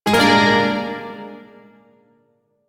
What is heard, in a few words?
Shawzin Min Chord L; DE; Warframe; Duviri Shawzin